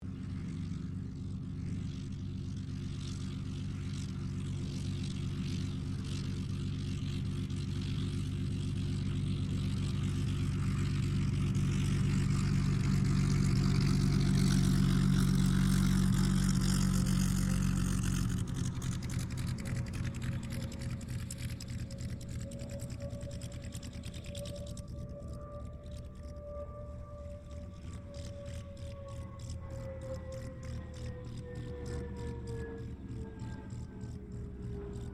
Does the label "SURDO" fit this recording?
No